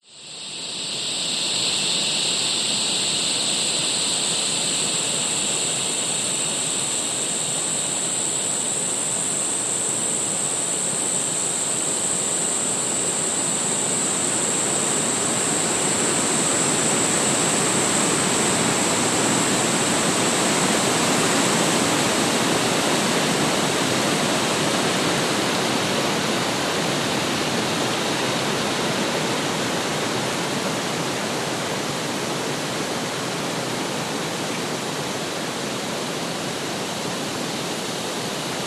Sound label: nature forest amb very windy tree tops high pitched insect waves